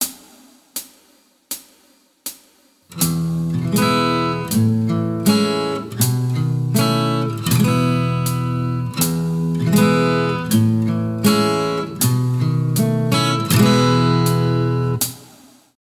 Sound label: Lickbag, Guitar